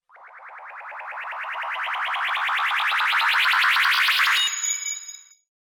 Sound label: loading